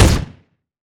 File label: lfe pistol shot, dry; weapons; Half Life Alyx